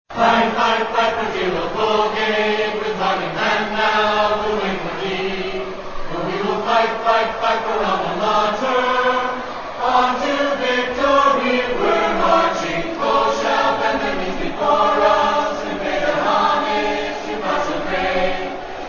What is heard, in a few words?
Fight Fight Fight vocal